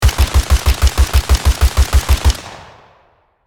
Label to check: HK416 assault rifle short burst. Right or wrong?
Right